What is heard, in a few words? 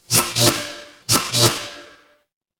fire electric zap